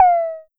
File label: Pollard Tom Hi, Syndrum